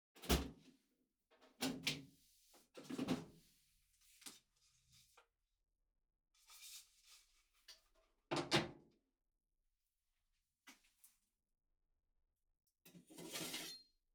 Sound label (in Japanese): まな板 を 出す, 食事に関する音; 3DIO